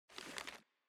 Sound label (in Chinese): 关闭报纸